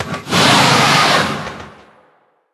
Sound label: Fear; Beresad